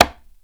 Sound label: CONGA, percussion; drum